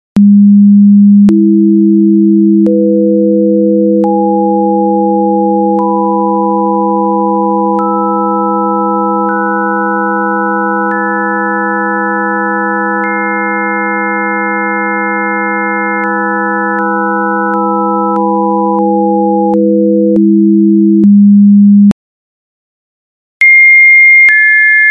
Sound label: Radio wave peaks; Tomas